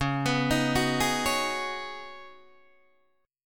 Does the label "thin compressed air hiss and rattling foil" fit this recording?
No